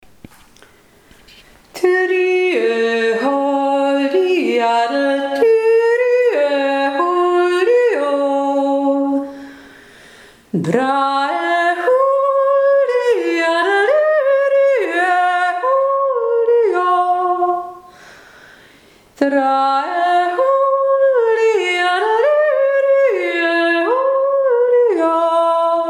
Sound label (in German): karner dreier stimme 3